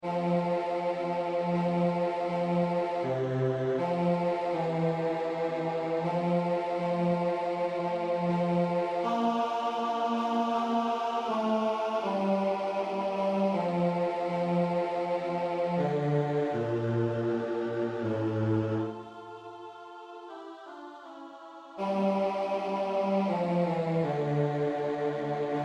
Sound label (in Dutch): bas; Motetten; Sancti Dei